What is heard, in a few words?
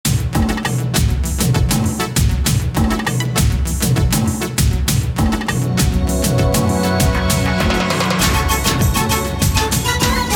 Music Ringtone